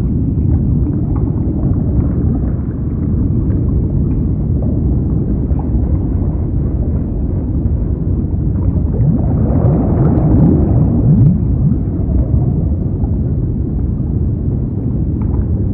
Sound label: water